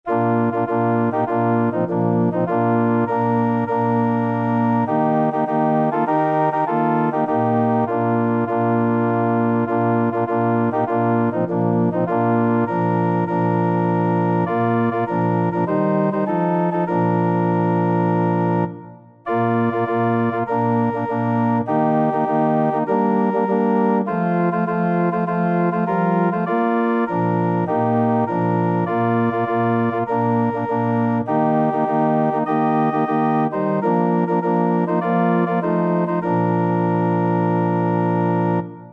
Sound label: standing on the promises, organ